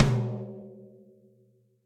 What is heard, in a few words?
Tom Low Ambient Loud Punch; Drums; Drum Hits; Ableton; Session Drums Studio; MPE